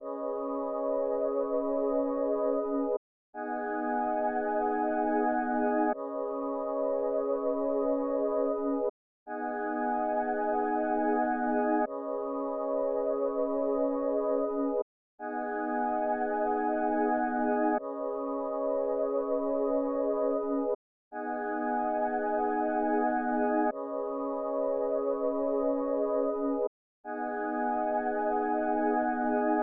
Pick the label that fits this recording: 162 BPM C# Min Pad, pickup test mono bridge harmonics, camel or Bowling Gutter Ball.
162 BPM C# Min Pad